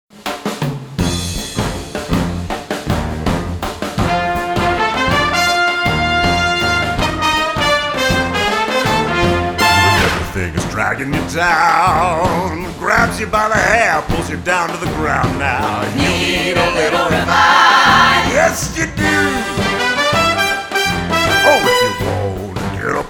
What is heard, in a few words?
Quick Revive Jingle; Shadows; Call of Duty Zombies